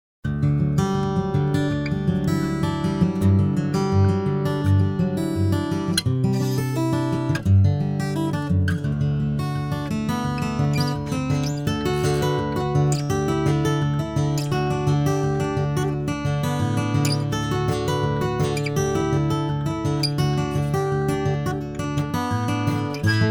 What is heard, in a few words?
Acoustic Guitar Dry